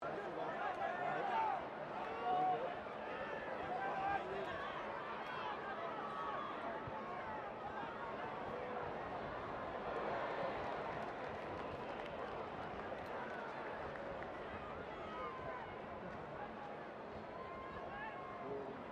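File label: crowd 15K Rugby World Cup distant loop, Rugby world cup game; Crowds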